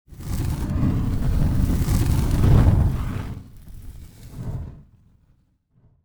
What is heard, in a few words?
Fire Blow Torch